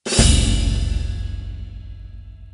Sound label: Player Lose; endless world idle rpg gamerip 2019